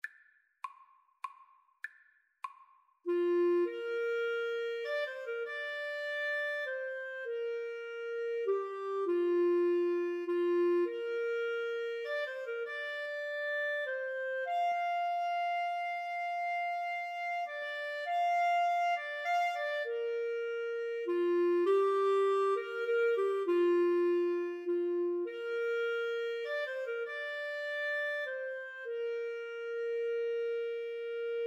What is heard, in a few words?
amazing grace, Clarinet-Saxophone Duet